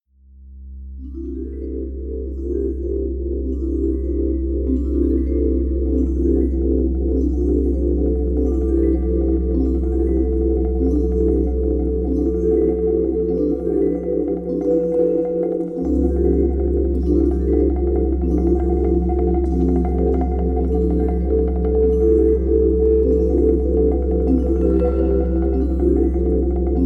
(Vibraphone, Marimbaphone, Malleted Wood, Two Synthesizers)